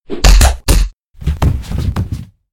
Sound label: knock out; attack